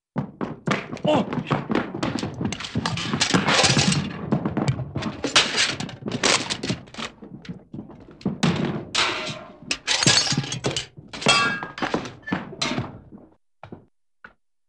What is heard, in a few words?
Group Of Bustling Footsteps & Misc Clatter, Cartoon; Hollywood Edge; Cartoon Trax